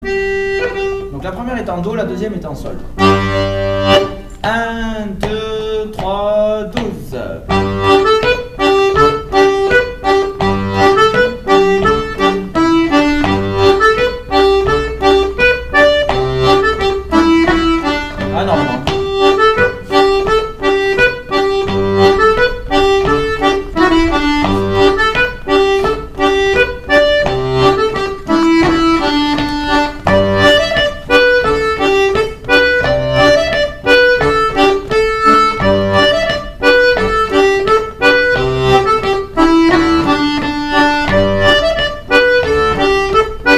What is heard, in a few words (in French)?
polka lent; Finish set